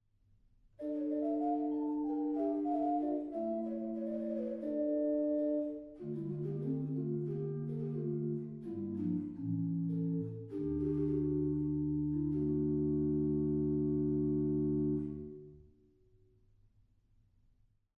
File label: Gedackt 8, organ; stade